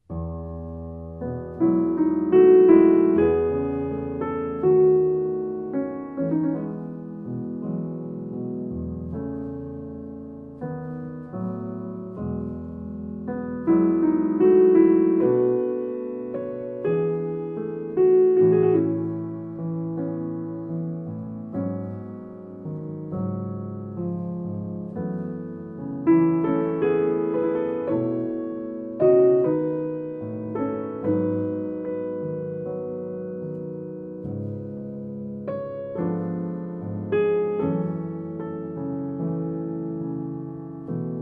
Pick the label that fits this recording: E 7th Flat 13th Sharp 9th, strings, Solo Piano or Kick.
Solo Piano